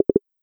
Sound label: Negative Stereo